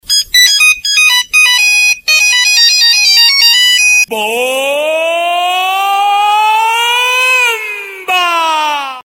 nokia bomba

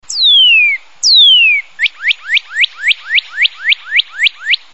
passaro cardeal efeito sonoro

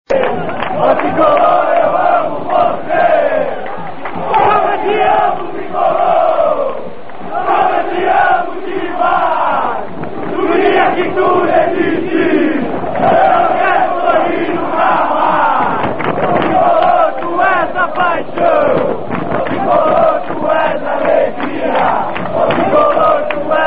Torcida Tricolor Independente, Como Eu Te Amo, Meu Tricolor; sao paulo; tricolor eu amo voce

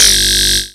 alerta perigo

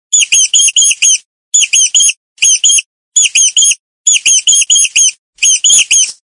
barulho de rato, sons de animais

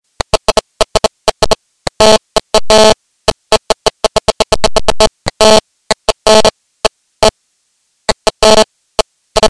interferencia cel

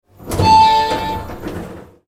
elevador

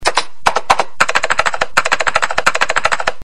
teclado, som de teclado de computador

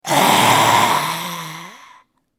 zumbi efeito sonoro

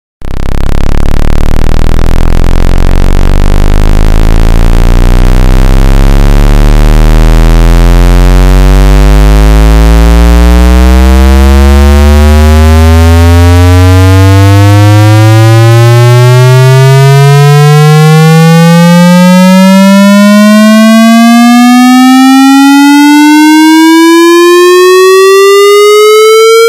toque do som mais irritante do mundo

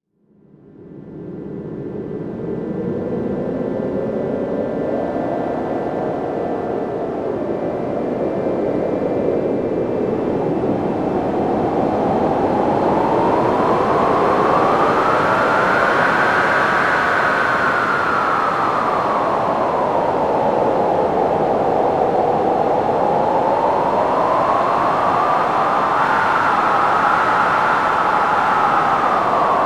vento do artico

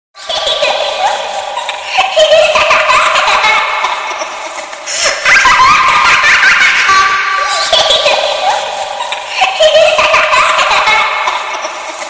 bebe fantasma

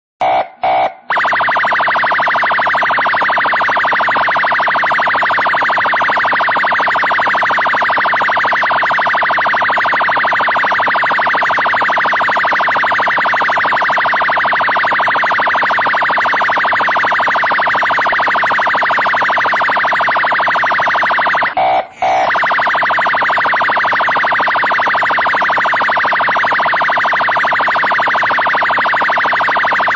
toque para celular sirene; sirene da rota

